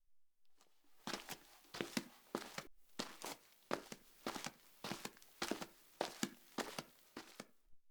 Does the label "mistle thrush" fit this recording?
No